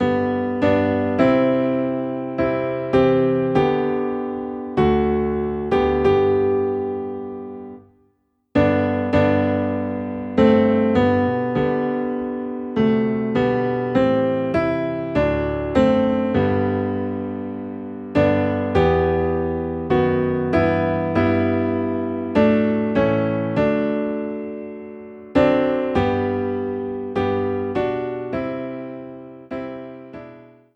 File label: Piano Low in G, hymns; It Is Well With My Soul